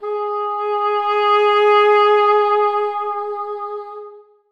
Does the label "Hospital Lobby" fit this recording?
No